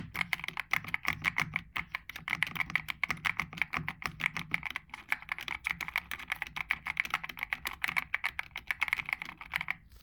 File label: io type 68 se